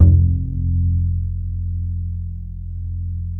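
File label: DB F2, double bass; Balkanish Traditions; USB Soundscan vol.63; AKAI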